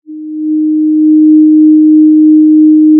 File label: pop, Sound of a cruise ship horn